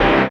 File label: dance chord